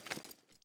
healthshot prepare

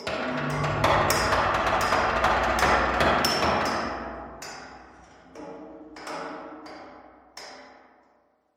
ambient pipe bang, metal

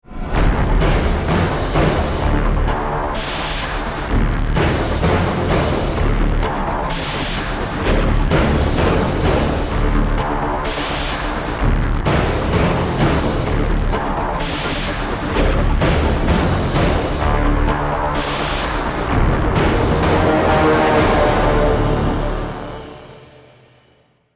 start round, music

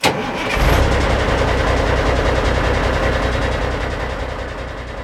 apc ignition; Counter Strike Model Pack; CS:GO

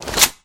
soldier right gun cock; kidnap; scripted sequences

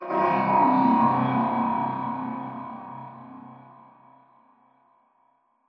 strider distant; Half Life Alyx; combine; c17

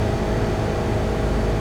machine; Counter Strike Model Pack; CS:GO; survival

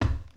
footsteps glass; player

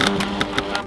combine terminal idle, machines; Half Life Model Pack; Half Life 2

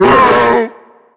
pain, zombie vox; creatures

